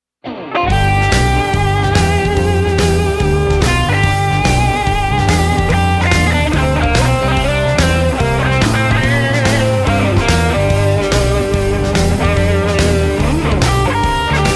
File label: female voice